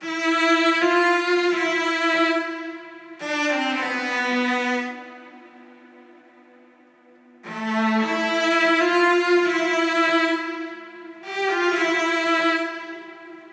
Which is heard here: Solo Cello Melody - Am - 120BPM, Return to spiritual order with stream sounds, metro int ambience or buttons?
Solo Cello Melody - Am - 120BPM